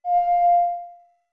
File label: Notes Ocarina F med; OOT